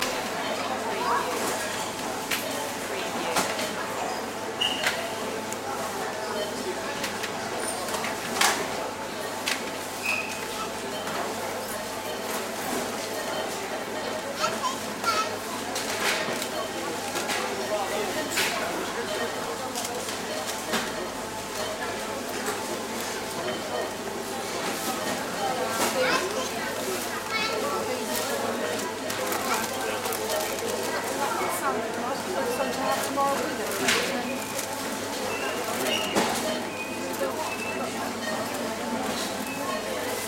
supermarket near checkout

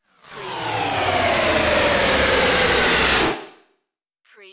Scifi Production Element - Downturn

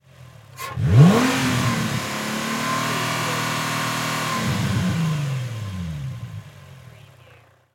INFINITY G35 ENGINE LONG REV

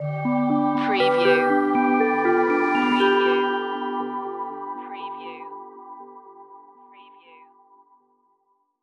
fairy dream magic stinger

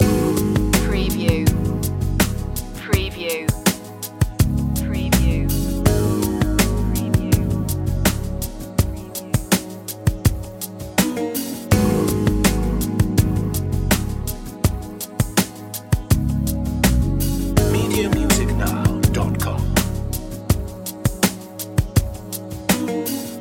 loop, chill

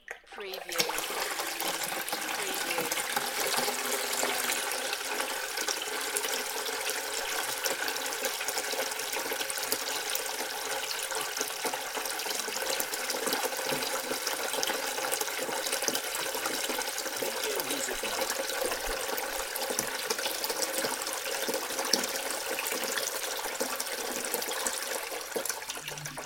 Filling with water; beanstalk audio

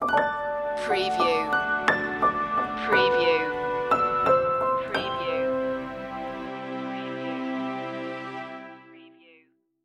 rain reflections stinger

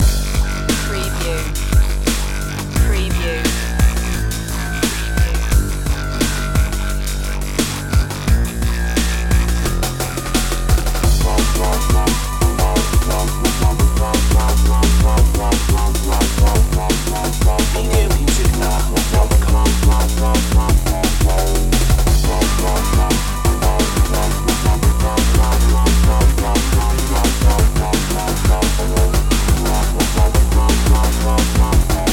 dnb

